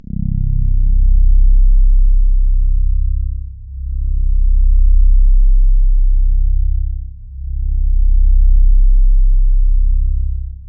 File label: C1, Synth Line; 90 s Sample CDs; NiceBeats; SynthLine VOL-18